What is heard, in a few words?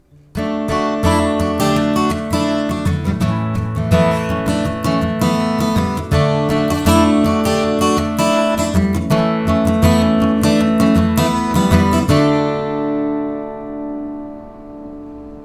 Suspended chord example